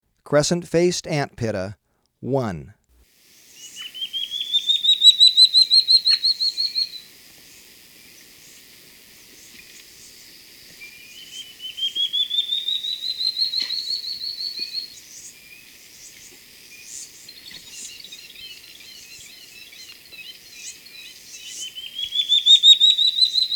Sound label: Crescent-Faced Antpitta, Birds of Ecuador; S. America